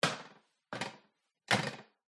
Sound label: household foldable metal stepladders footsteps hard bottom trainers climb